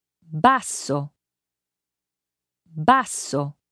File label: basso